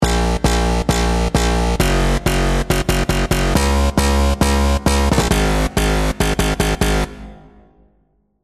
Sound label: COMP BOUNCE BASS